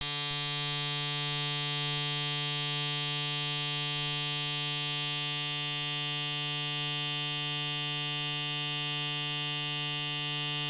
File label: braun series3